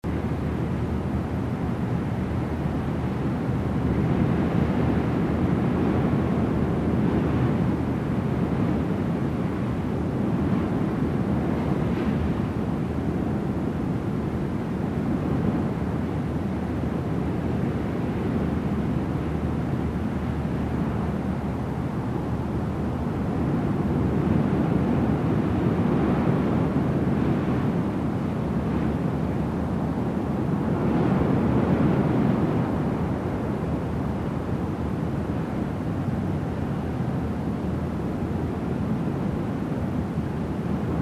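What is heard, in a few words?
WIND Interior Small room Gusts Droan loop; gamerip; The Unfinished Swan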